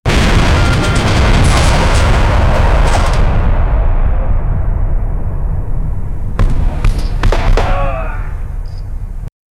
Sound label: epic battle ambience clas, clashing swords